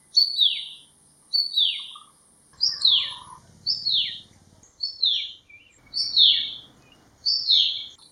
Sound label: Buff breasted Babbler calls; Frasers Hill Pahang Malaysia